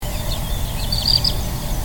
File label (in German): bruchwasserlaeufer; vogel